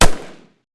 ak105 silencer, weapon; metrocraft

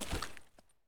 sand footstep; metrocraft

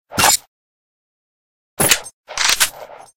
unload, weapon; metrocraft

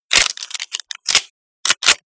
vityaz load, weapon; metro

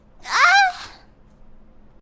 Death, girl, evil; customnpcs; human

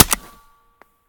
tihar shoot, weapon; metrocraft

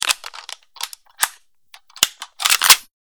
avt40 shoot, weapon; metrocraft

